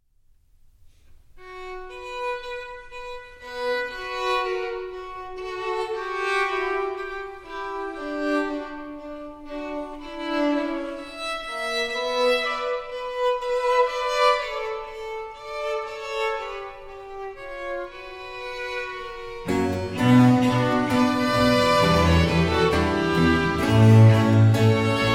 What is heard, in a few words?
concerto for cello in C minor allegro non molto